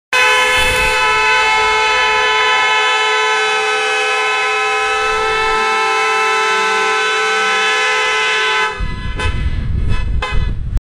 a loud air horn blast used at a sports event

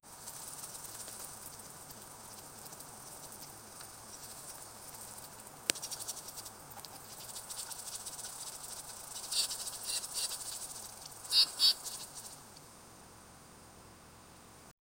tiger running over autmn

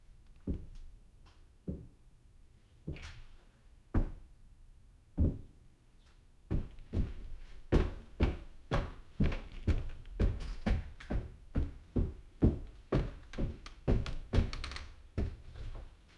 eerie ambient sounds of a

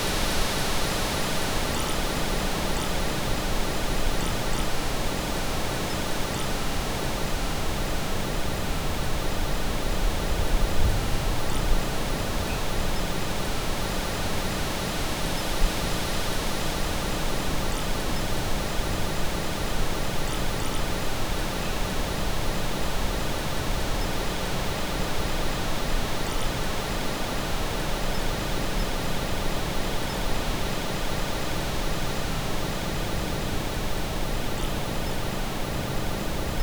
forest night with gentle

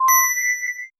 crisp minimalistic futuri

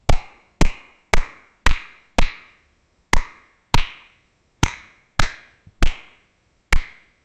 cartoon boxing match punch sequence

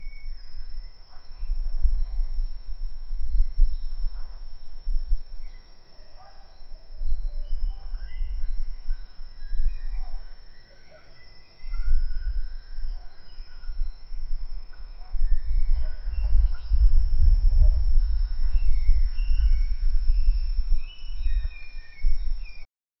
park background muf; dubai

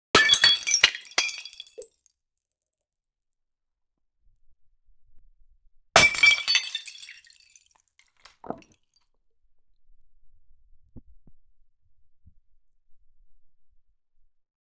a sound of a glass

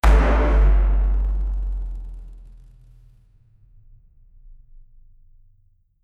the iconic vine boom sound for comedic timing

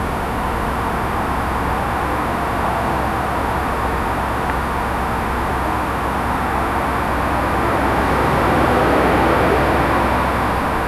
slowly fading in ambient

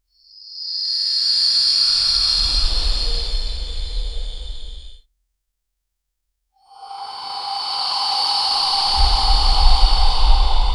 low preassure air escapin

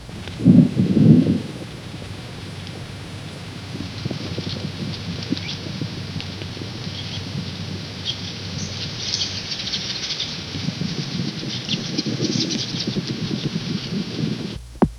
soft rustling leaves dist

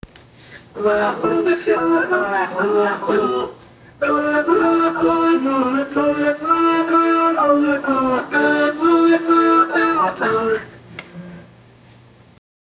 birthday song cake

